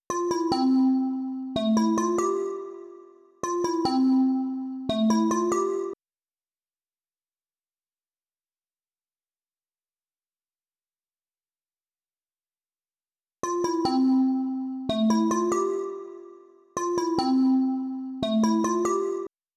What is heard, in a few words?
144 BPM D Min Bell; Cymatics; 2022 Melody Collection; Wavy; Jupiter